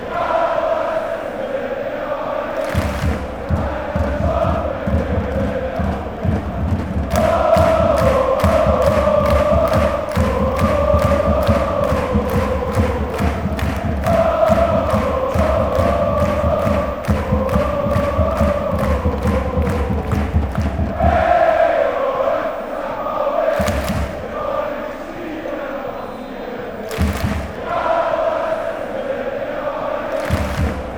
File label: claps, fanchants